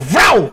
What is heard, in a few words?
vraaaaaaauuuuuuu